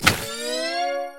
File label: slime boost; Nickelodeon - Capture The Slime